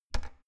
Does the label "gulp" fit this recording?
No